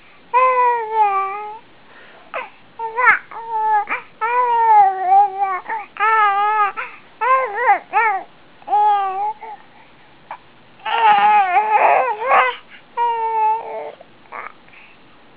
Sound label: vowels